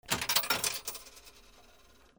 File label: PHONES